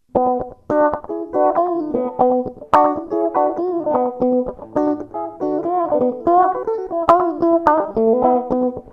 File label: AutoWah Guitar on